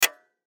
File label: Button Mechanical Click Spring Reverb; Button Mechanical Click Ascend Production Element Imaging Element Accent Transition; Multimedia